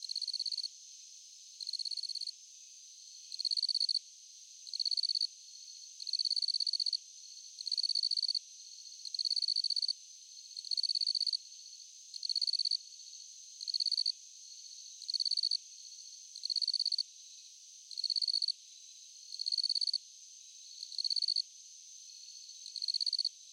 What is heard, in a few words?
NIGHT CRICKETS